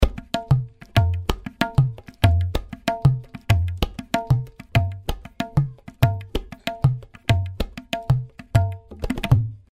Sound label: TABLAS